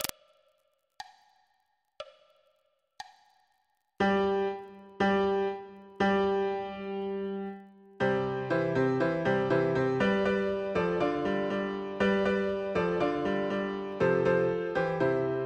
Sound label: polka; acapoulco